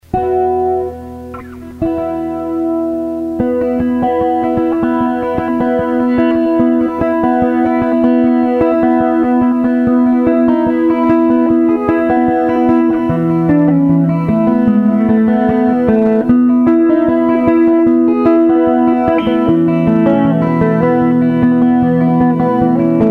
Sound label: celtic wave